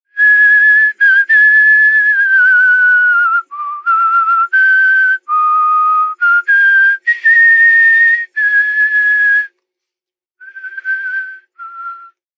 Whistling, Male, Whistle, vocalization, (human voice)